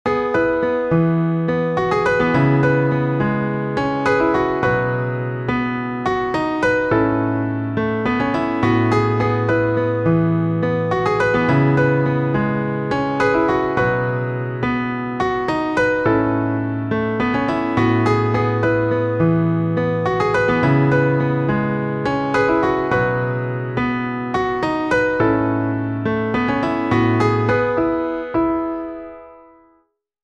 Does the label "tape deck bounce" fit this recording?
No